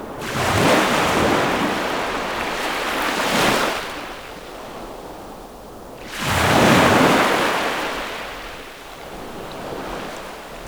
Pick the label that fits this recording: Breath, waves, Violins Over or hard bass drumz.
waves